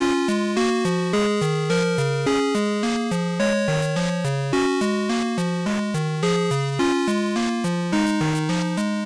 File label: bgm Chip; ゆめ2っき